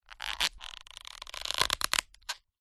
foley plastic squeeze stress creak against dense foam; zapsplat